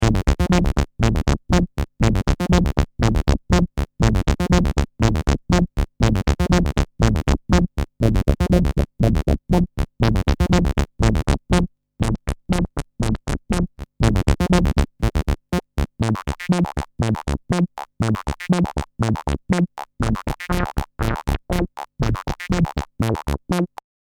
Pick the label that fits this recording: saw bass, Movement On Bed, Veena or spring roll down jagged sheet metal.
saw bass